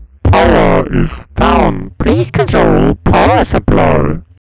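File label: elevator error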